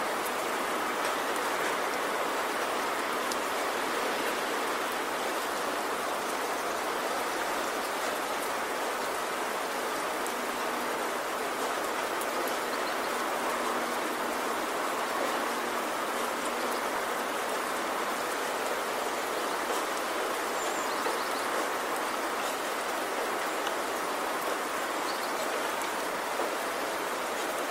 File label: Rainforest Sounds